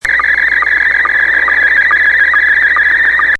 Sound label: sstv; digitaal